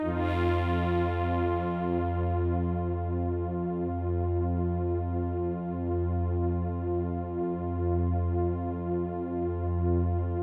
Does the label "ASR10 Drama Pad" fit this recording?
Yes